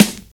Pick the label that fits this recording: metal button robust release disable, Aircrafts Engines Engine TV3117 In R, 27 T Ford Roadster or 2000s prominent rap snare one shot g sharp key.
2000s prominent rap snare one shot g sharp key